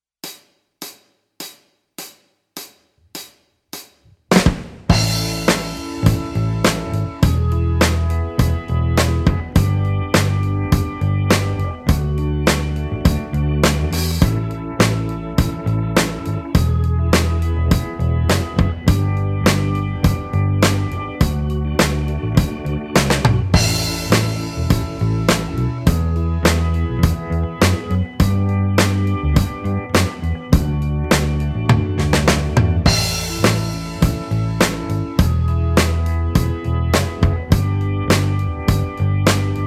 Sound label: 6ths Backing Track, guitar